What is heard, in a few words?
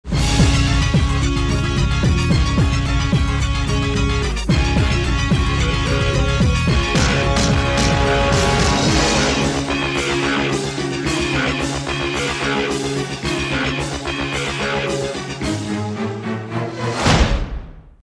trailer music hindi; moulinrouge